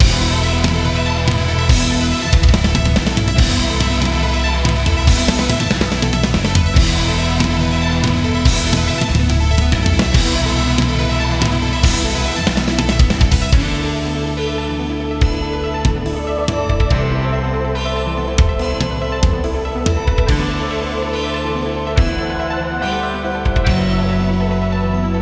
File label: Final Boss Music Loop